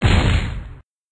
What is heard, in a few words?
explosion; Grave Drive